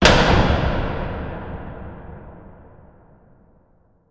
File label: hit heavy metal hard reverb cinematic; sound design; zapsplat